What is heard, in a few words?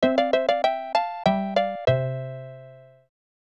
Song (Lullaby); Sword World SFC 2; SNES